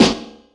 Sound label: SP1200 snare; E-MU Formula 4000 Series Vol. 1; Hip Hop Nation